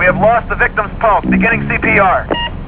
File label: lost puls